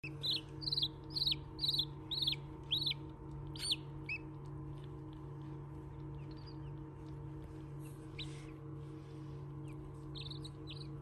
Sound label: Hatching chick from egg